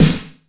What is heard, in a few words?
WET S909, WET SNARES; Masterbits - Soniq Elements